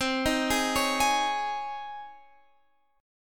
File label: Db Major 7th Suspended 2nd C